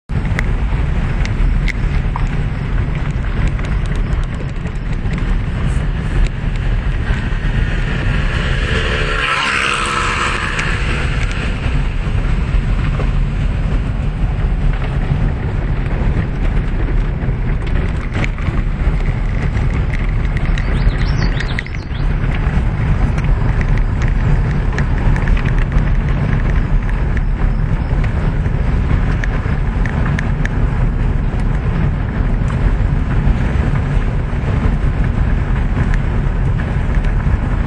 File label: Dirtroad ride, nature; Riding on a Dirt Road; Newcastle Island, New Hampshire; It's been a long day of hiking